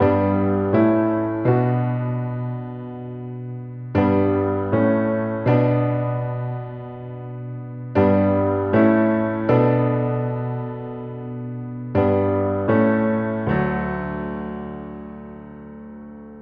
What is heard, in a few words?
Piano Lounge Intro